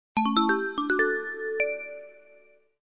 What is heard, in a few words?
Basic Alert; Pantech P2020-Ease; Alert Tones